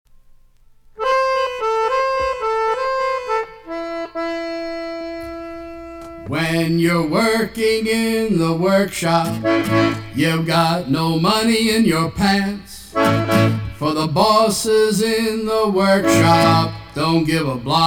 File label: workshop blues; nfb songs